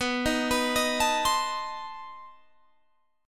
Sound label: B 7th Flat 9th B Guitar Open G